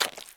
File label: mud walk, step